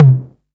tom one shot c key, Royality free tom single hit tuned to the C note. Loudest frequency: 205Hz